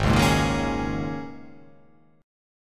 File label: G sharp Suspended 2nd Suspended 4th, G sharp, down